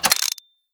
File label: quick Stop